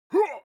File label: human vocal male shout hit soft